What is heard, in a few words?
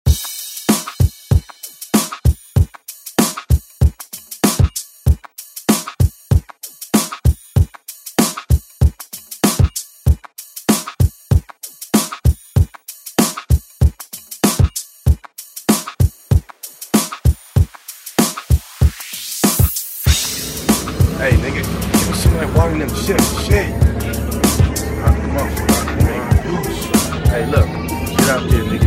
90s Re Drum; DJ Mhark